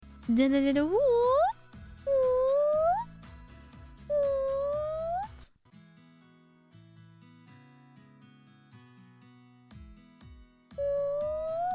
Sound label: Kricketune impression